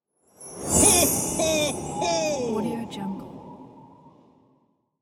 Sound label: santa ho ho ho